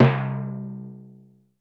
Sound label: TIMPANI; Crypton - DrumBase5000